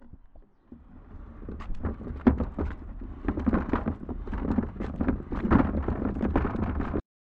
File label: Rolling Log